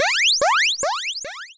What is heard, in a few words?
flag; Worms